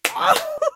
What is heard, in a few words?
clap; irish pot luck